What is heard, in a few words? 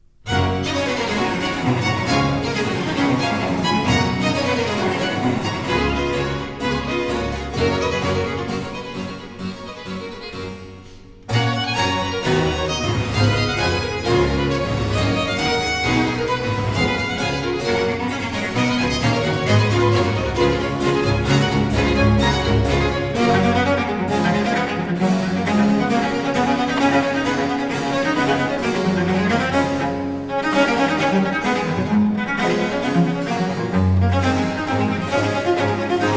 concerto for cello in g major allegro